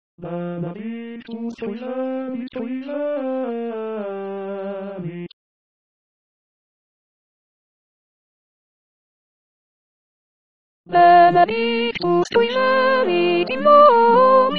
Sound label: Soprano; Benedictus; Missa pro pace